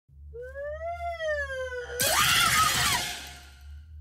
ohhh; gir

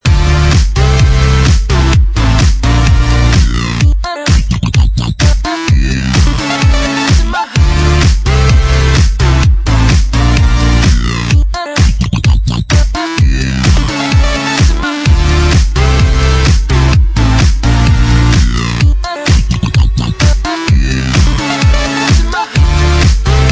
electro music

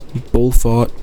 ball fart

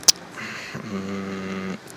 hmm

